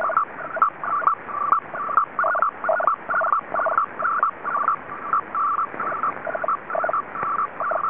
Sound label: TWINPLEX; TWINPLEX system used by Pakistani diplomatic stations; TWINPLEX-SITOR